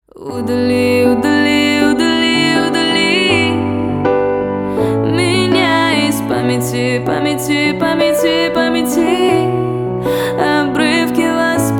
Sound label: acoustic version